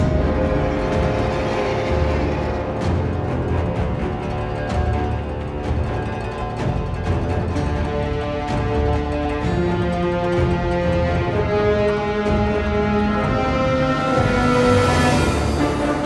bg music preparation

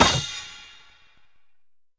hero select

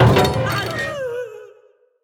beam workers tap; easterEgg

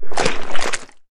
boss leviathan tentacle grab

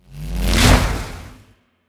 lightning bolt; arivan

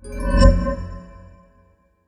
wild magus disruption cast

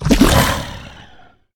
shadow spawn spawn

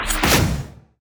range cast; crocs; wise

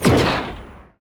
spiders bossfight range

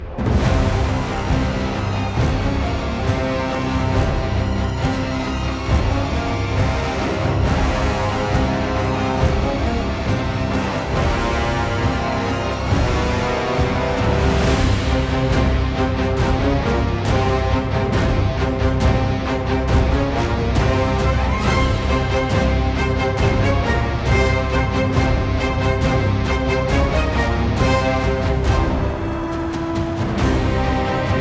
bg music boss

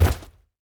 enemy patrolling Vulture death

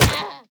enemy sheep death

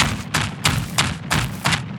minotaur charge trample; LOOP

